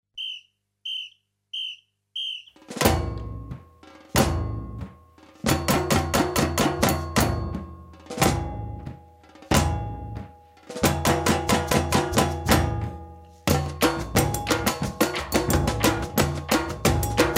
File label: groove